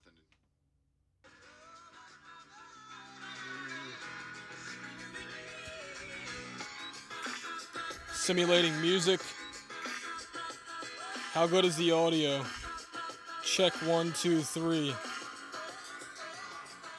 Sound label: ElectroVoice ND767a isolation, shure ksm8